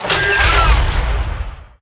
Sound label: Piloted Sky Golem Attack